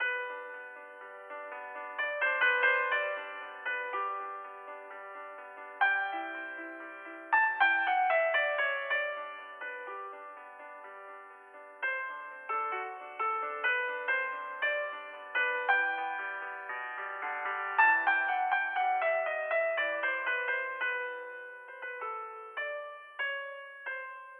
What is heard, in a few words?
Cafeteria Music; Mass Effect Infiltrator